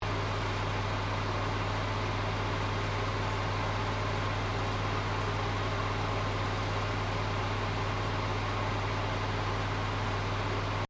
OVEN FANFORCE OPERATING DOOR OPEN LOOP, Common oven sounds; Appliance Sound Effects; Appliances (Sound Effects:Household)